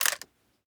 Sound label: mp5 bolt back; Rust